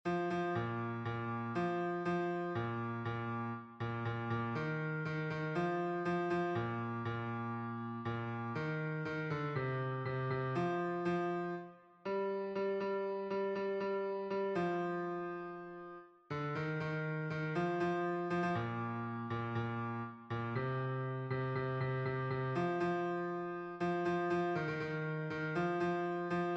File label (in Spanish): BAJO, himnos en piano; 38 DIOS MIO; cantos del camino